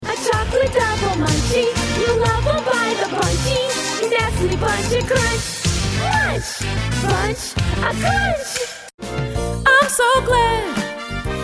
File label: Jingles